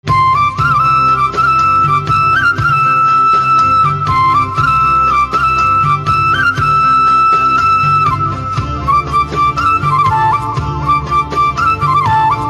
Flute Instrumental Ringtone

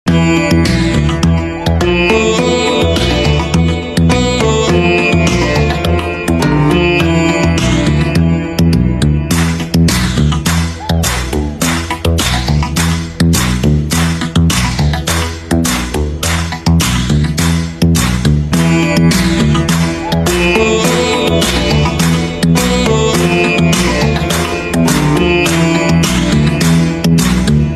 Instrumental Ringtone, sitar and veena

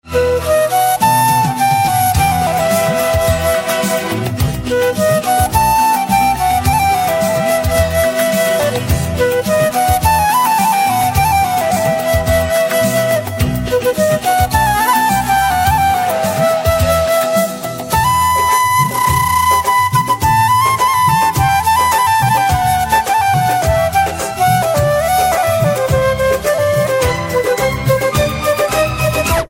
Flute Instrumental Ringtone

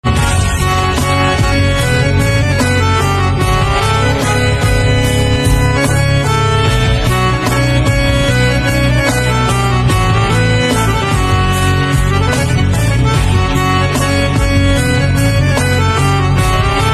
Harmonium Instrumental Ringtone

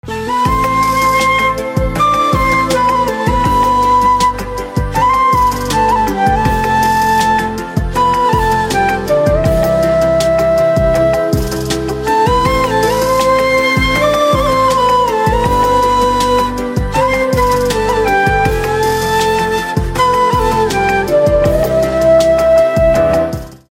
Flute Instrumental Ringtone

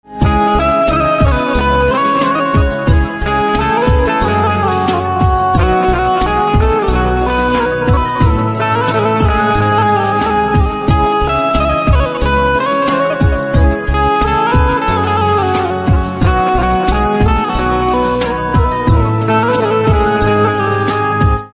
Banjo Instrumental Ringtone

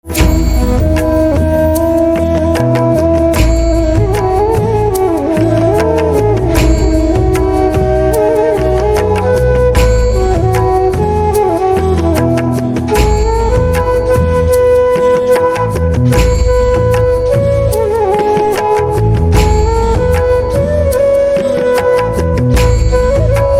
Flute Instrumental Ringtone; devotional instrumental ringtone

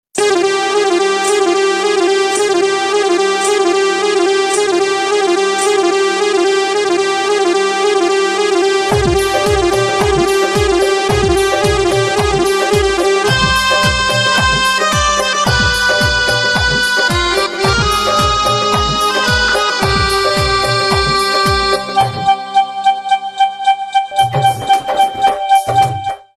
Music Ringtone, sytrus synth

